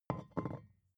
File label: vodka put down tipping